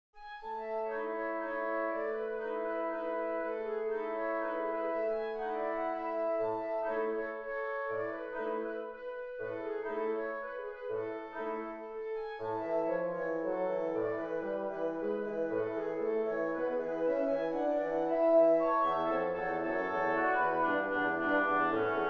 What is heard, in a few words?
Spring Flute Oboe Bassoon; Grieg